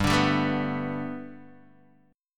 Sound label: G Major Add 9th Guitar DADGAD, chord